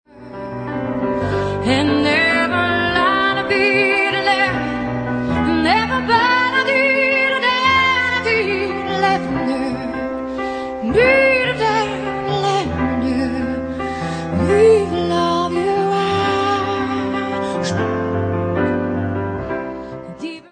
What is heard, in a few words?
improvisation